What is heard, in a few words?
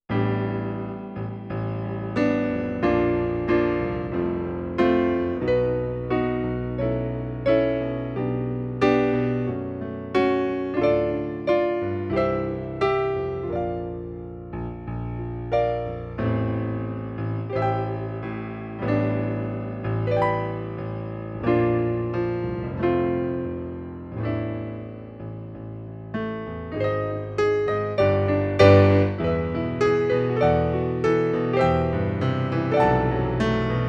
accompaniment